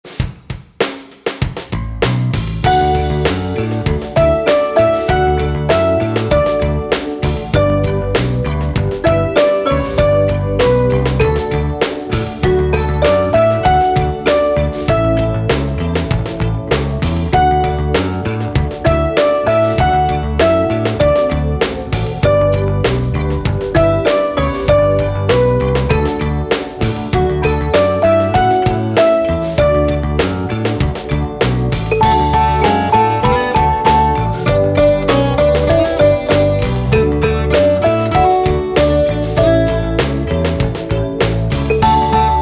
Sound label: ukulele